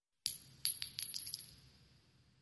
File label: Shell Casings Single; shell casing movement